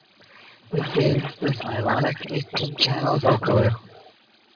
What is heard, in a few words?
wet